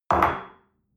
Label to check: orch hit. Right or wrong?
Wrong